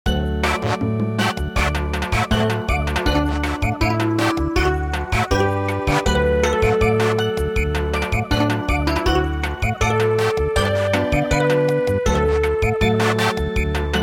Samba, midi; SHIRAKUMO NABIKU

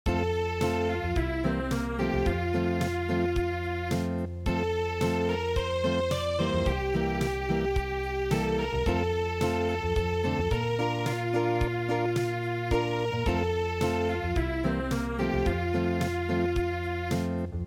Acoustic

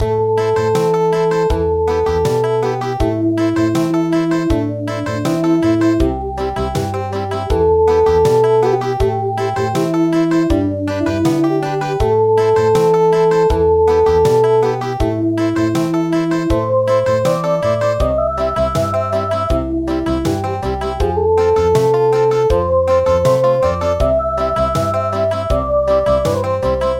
Country, midi; Hame Hame Hame

